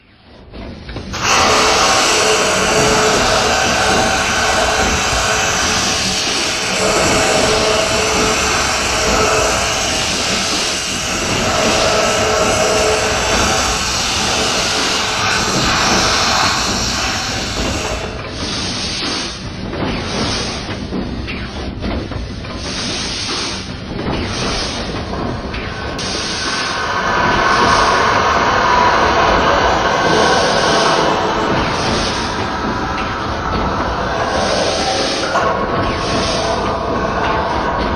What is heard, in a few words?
Fan Room; The White Chamber